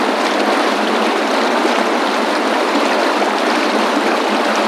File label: water stream; nuke